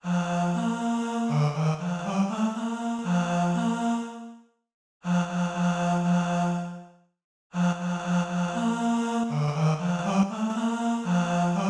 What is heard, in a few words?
Varsang tenor bas